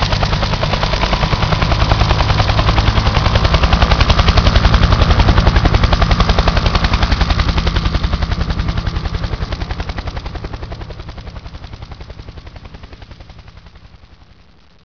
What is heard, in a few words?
chopper leaving, ambience